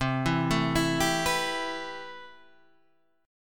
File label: C Major 7th Add 6th C